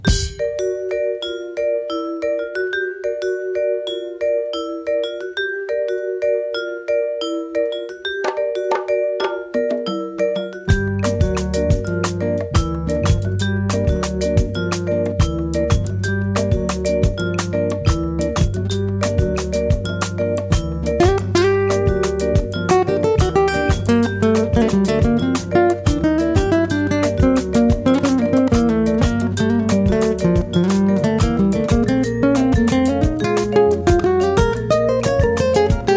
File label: Son Suave solo